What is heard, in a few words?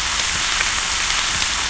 fry, player